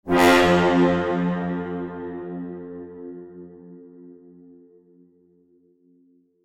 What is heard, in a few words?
pure space orchestral cadence